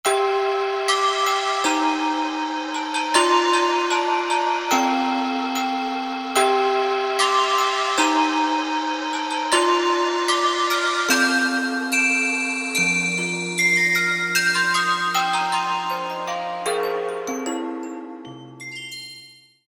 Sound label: Spect Bell, Roland U-220; Factory Timbres